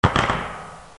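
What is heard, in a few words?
METAL EXPLOSION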